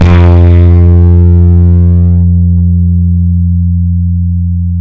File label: FUZZ F 3, Bass; Spectrasonics - Bass Legends CD 2